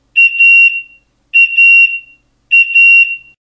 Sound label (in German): Rauchalarm; Pyrexx